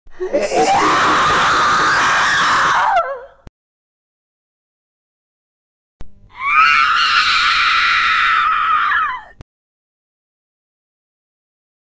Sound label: horror sound suoni spaventosi creepy sounds halloween